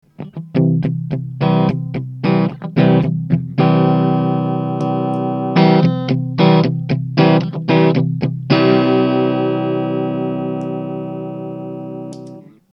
Bypass boost, tube, Tremler

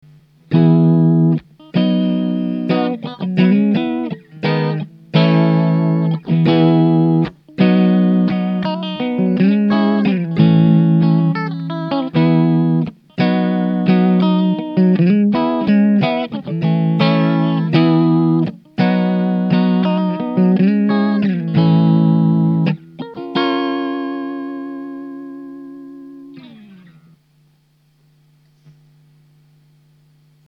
vb-2 chords, modulation

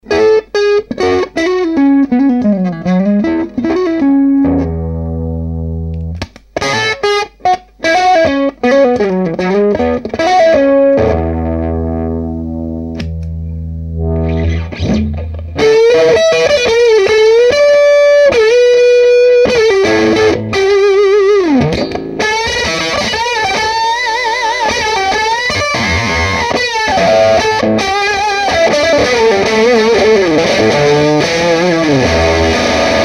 octavia, octaver

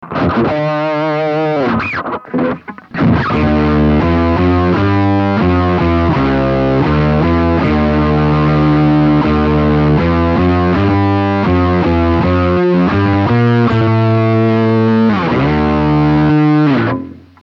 one down, Pearl octaver

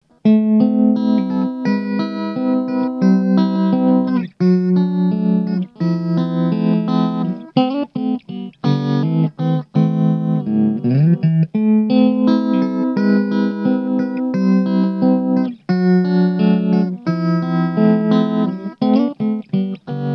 Medium depth med fast, Tremler, tube

